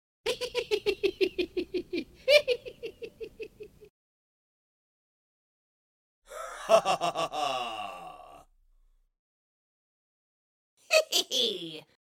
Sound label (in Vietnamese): Tieng cuoi cua ke ac gian tra